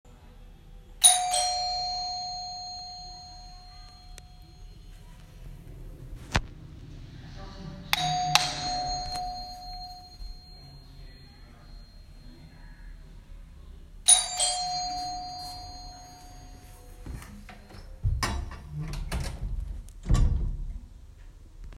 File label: Doorbell; Doorbell on ceremony day